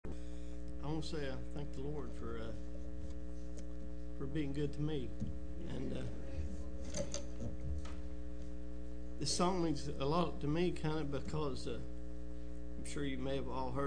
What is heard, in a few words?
singing Family